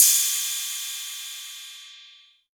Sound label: 808 Cymbal; Novation Drumstation V1